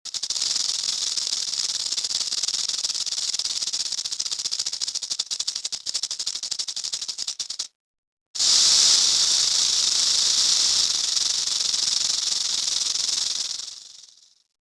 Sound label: Western Diamondback Rattlesnake